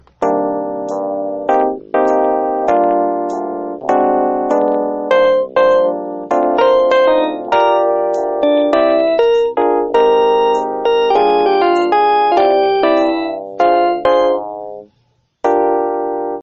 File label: Panasonic Ring; meloboom